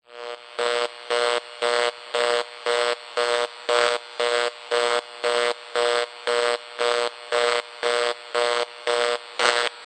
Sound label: loud digital alarm siren